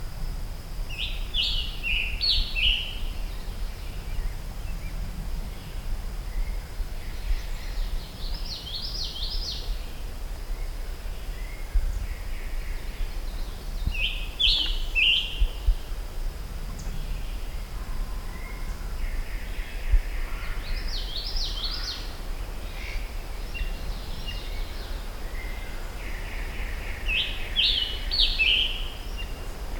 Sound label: Piranga olivacea; Scarlet Tanager; MI; Michigan Bird Songs